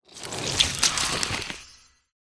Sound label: Scolopendra DIE